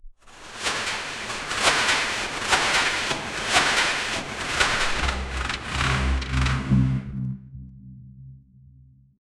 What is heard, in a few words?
latex materials slow